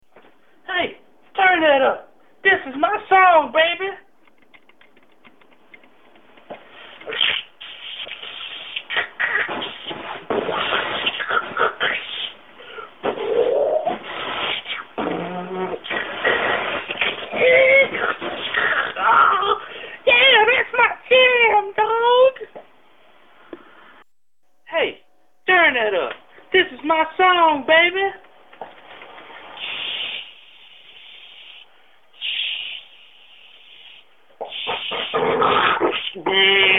Bad radio station signal reception singalong outtakes